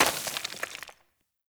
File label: Thorn Bow Stone Arrow Impact Default; Weapon Impacts; Player; Envoy Fey Bow